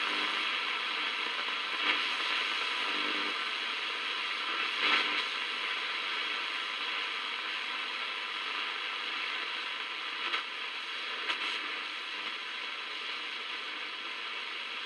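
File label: hiss in analog electronics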